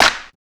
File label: clp, claps, drum perc; house; PS-28 Vocal House Samples